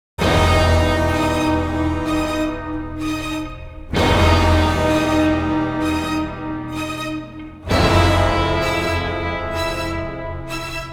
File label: Invasion Sting 128BPM 4-4; Evil Genius 2 World Domination; PS4; Xbox Series X/S